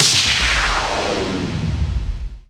FX HIT, Techno Trance; Ueberschall

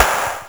Clap (8493Hz); Sonic 3; M8; Genesis

